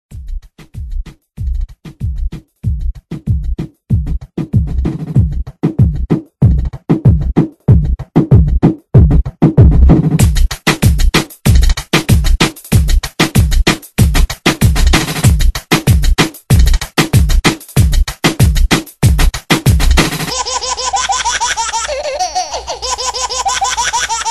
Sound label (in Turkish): komik cocuk remix muzik